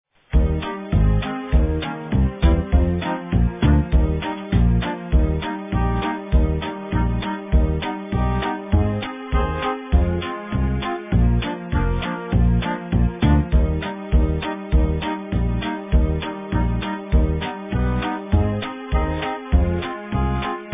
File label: jingle